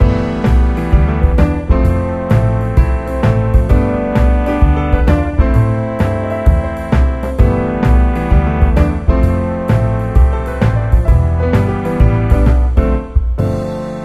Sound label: Jazzer; Kyocera DuraForce; ringtones